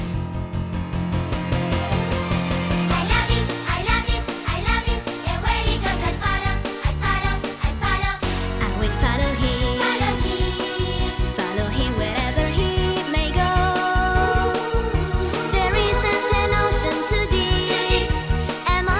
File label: Medley gospel